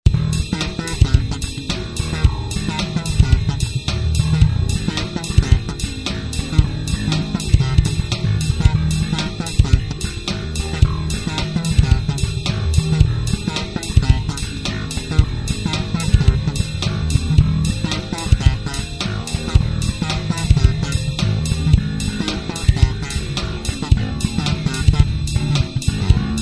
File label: b-funk